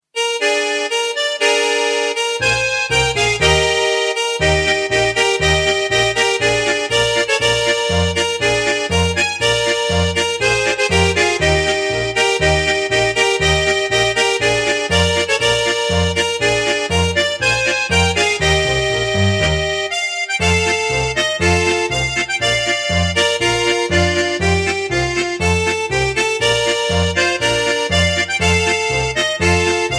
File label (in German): Ski Lift Polka, akkordeon